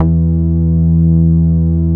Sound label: MOOG E3P; 90S MOOG